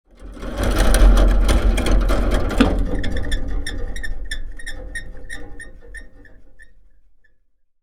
Metal gate rolling sliding; squeak sound effects

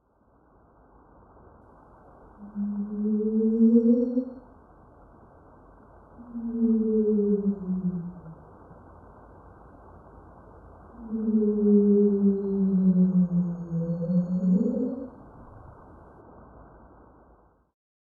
Whale call underwater perspective

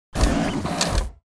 Sci fi gadget ready to use

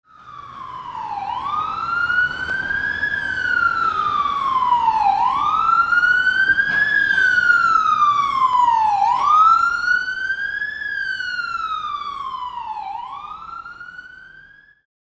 Ambulance emergency pass by siren on

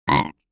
Cartoon burp

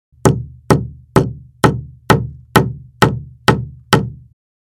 Hammer hitting wood panel hammering

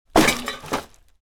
Garbage bag throwing away with impact trash can

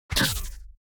Sci fi forcefield impact energy shield

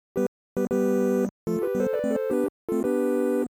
Retro 16 bit or 8 bit success melody win victory

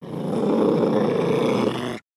Angry wolf snarling

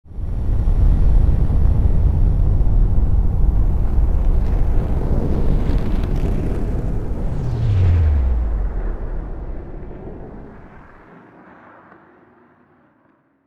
Spaceship alien craft landing

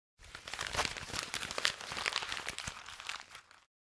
Sheet of paper crumpled

